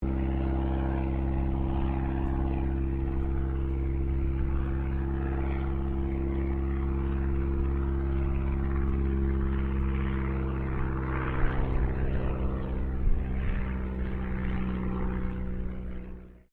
Aircraft Dehavilland Tigermoth Flying Distant Loop, RAAF Tigermoth biplane; RAAF Tigermoth biplane training aircraft